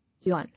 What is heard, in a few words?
Yawn